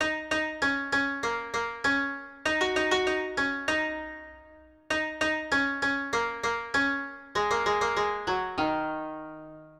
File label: Koto, Hip Hop Exotica, 098 Emin; Apple Loops; Big Fish Audio